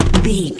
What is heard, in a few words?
footstep metal land